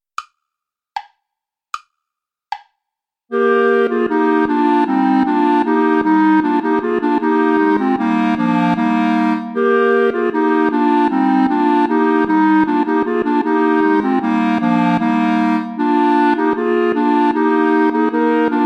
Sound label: play along without bass clarinet; traditional